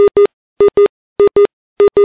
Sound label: TIMER; VCLOCK13